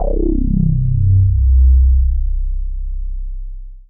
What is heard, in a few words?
Dance Floor Bass, Multisample Basses